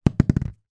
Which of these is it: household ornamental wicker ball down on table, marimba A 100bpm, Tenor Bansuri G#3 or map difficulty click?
household ornamental wicker ball down on table